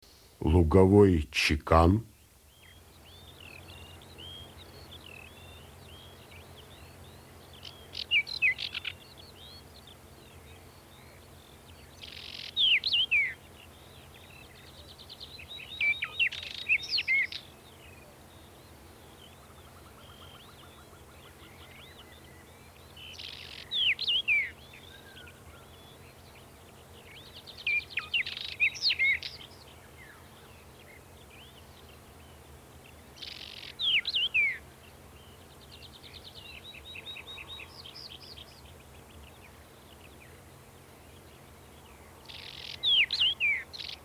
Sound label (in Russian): lugovoy chekan golos